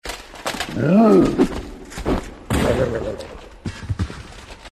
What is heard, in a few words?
hunter death